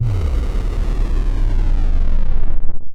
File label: digital dust rumble; nova sound